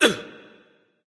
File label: grunt attack bow; smite; voicepack; ullr